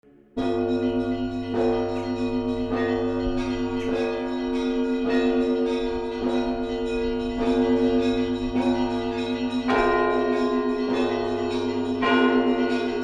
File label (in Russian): krasniy zvon